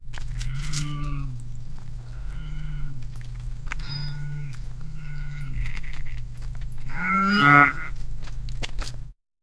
cow turns its, farm

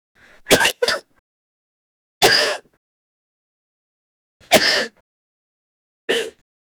a sneeze

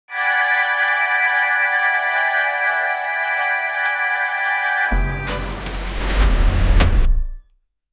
youtube intro video sound

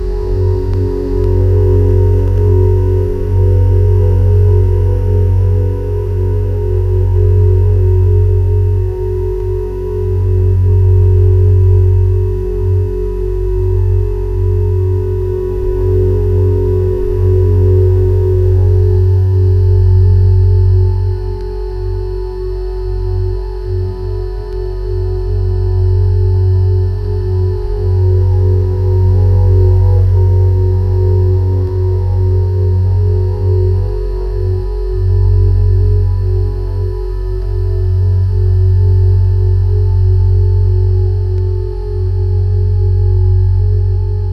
sounds of deep pink noise

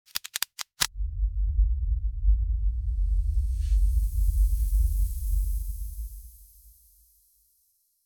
epic cinematic core ignit

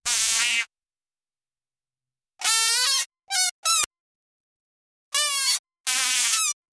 chick sound, baby animal

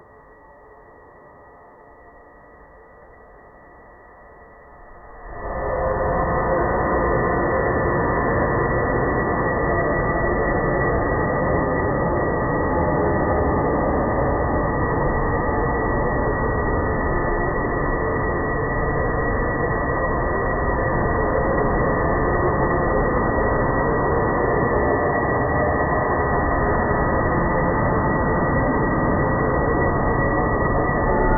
dart ambient scary backgr

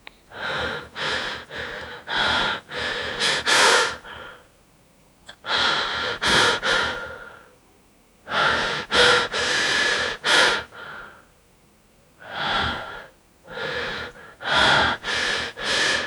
years old man holding, mouth closed

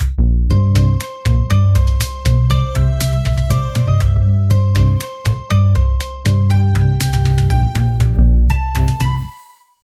intro music, tv; intro music for zuzu tv

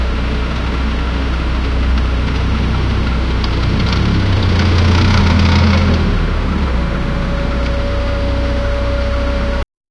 tractor engine rumble; rural

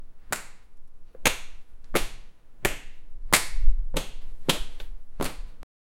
cashing, cash register

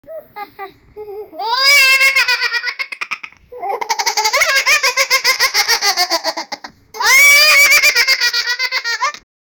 child laughing

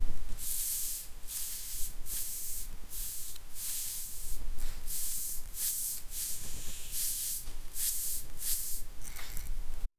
wood bristles of a hairbr, brush

